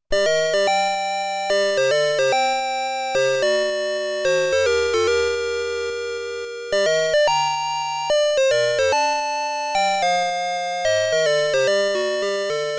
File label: Serenada Shuberta